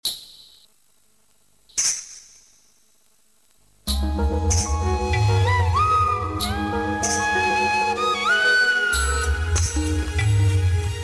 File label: Trap Horror